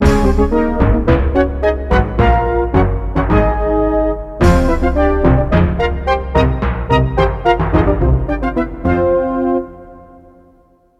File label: Fanfare; Ultima VII The black gate and The Serpent Isle